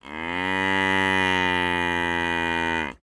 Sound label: Bull mooing